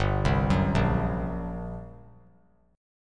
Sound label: G Minor Major 7th Double Flat 5th, G; arpeggiated; JGuitar